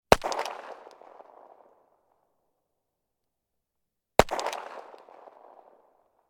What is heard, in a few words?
gun 1911; weapon; still north media